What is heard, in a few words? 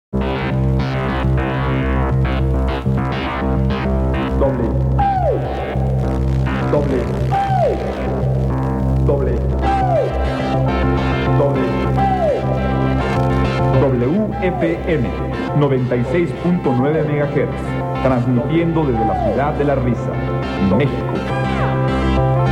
Jingle, radio